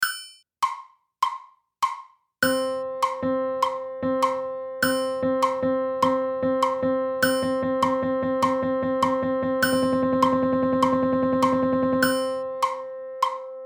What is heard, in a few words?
group notes sound triplet